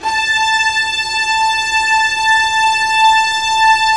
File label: STR SEC, STRINGS; Roland - LA Composer vol. 1